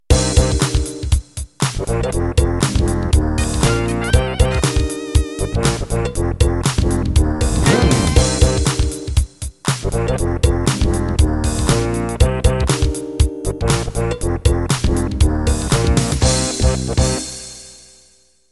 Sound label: Rap End